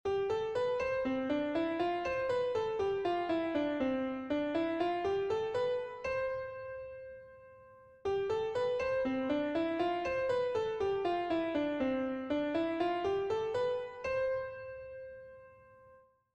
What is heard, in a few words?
melody